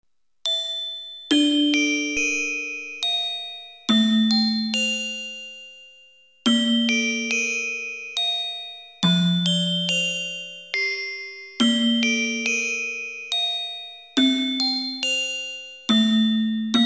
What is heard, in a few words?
fire